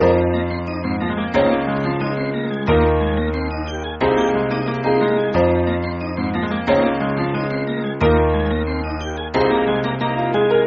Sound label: sample melody